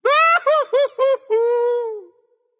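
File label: non verbal fear, voice; cernunnos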